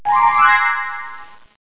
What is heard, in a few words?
Magic; Radio Active - The Music Trivia Game Show (1994); Sanctuary Woods